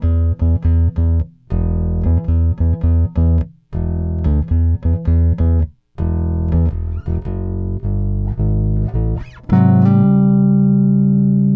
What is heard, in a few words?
Bass (Ending), Blues; Digidesign; Pro Tools; Pro Tools Essential Demos; Essential Loop Demos; Demo Session